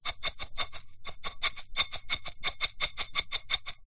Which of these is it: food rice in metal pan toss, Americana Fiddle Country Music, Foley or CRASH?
food rice in metal pan toss